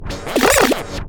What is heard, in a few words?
time travel; gamejam-22